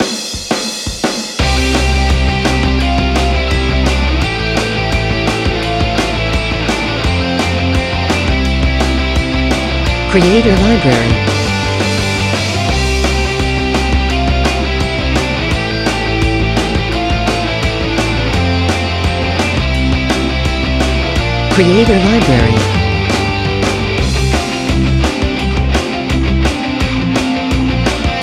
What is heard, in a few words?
College Band, Rock